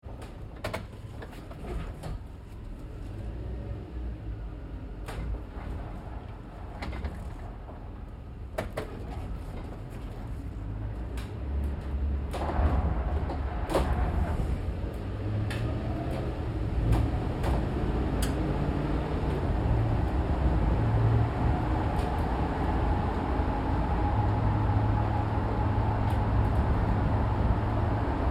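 Truck GMC Savana Interior Drive Accel Decel Med Fast; Trucks; Big Room Sound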